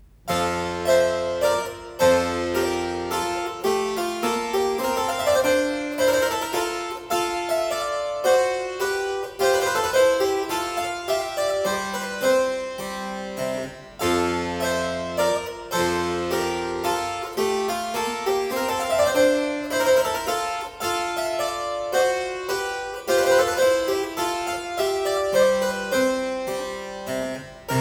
Minuet in F; Nannerl Notenbuch; KV.1d

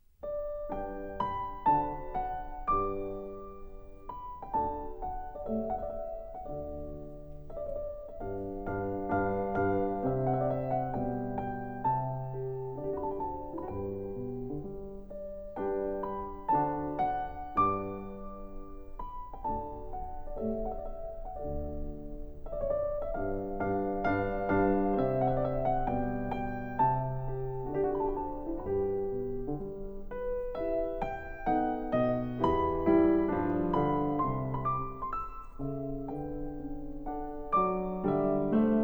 Piano 4 Hands Thema Andante; Andante and Five Variations in G Major; KV.501

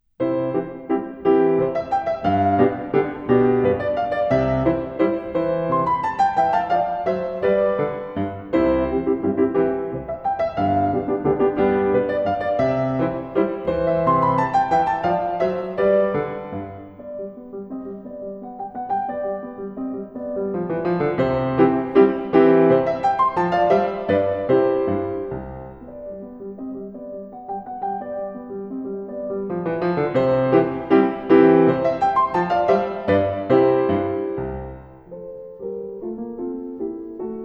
Menuetto in C; 8 Menuette KV.315a; Menuetto 4

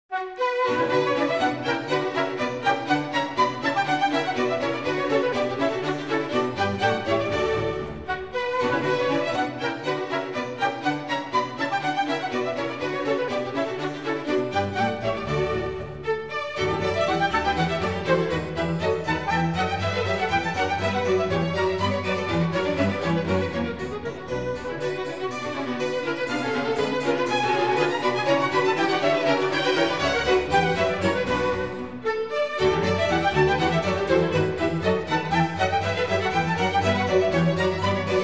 Orchestral Suite In B

